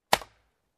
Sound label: Bright Wood Chop